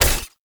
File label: Pod Shotgun Last Shot; Warframe; Weapons; Tenno